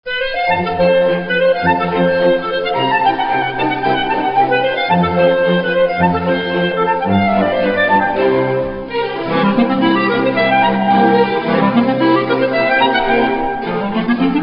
clarinette; weber

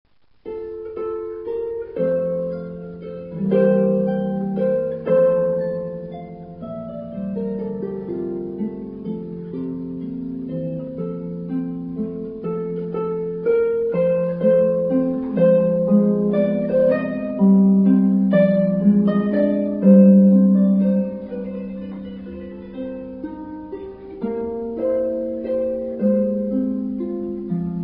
harpe; Famille des cordes pincées; Variations; Spohr

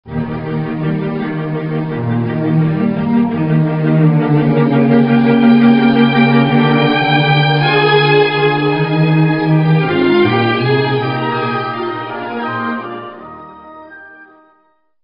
violoncelle orchestre

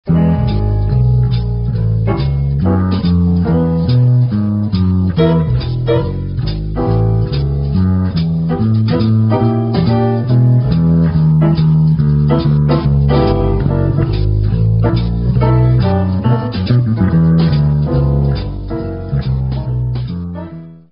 contrebasse jazz

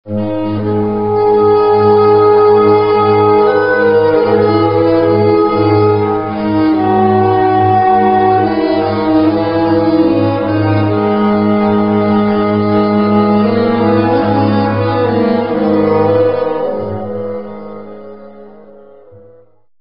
saxophone orchestre; "Le vieux Château" extrait des tableaux d'une exposition de Modeste MOUSSORSKY

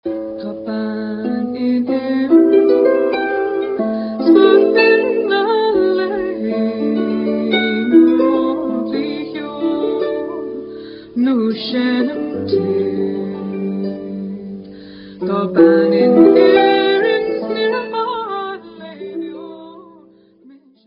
harpe folklore; Un air traditionnel Irlandais

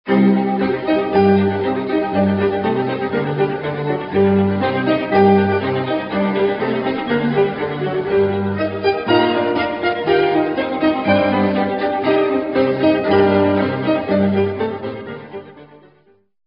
piano chambre; Famille des instruments à cordes frappées

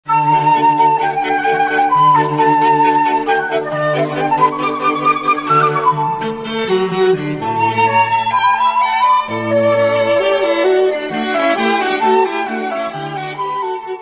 flute chambre

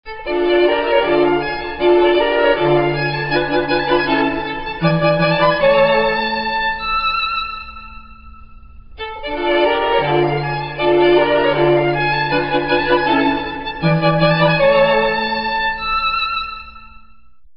violon chambre

